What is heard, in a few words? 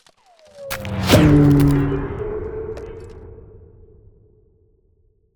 Ice Spell Whoosh, Cold Whooshes; Blizzard Surge; Arctic Aura